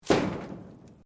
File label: METAL SHEET MOVEMENT; Materials